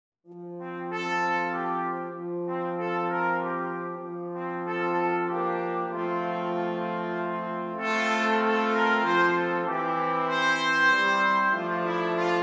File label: brass 5, brass quartet